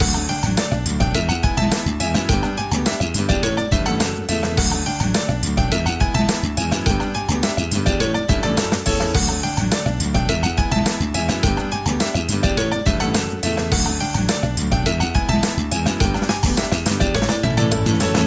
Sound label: Crazy Upbeat Theme Loop